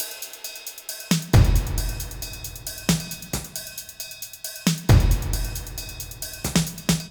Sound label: Beat Full Perc & Snare, 135bpm; Zero-G Sound Sense Grime Scene; Construction Kits; A Positive Vibe